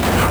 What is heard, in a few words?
conveyer belt; 2fast2curious